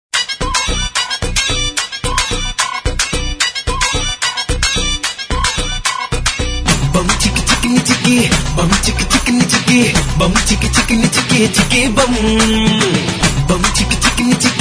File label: male version ringtone